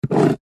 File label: office handwriting pen draw line on cardboard box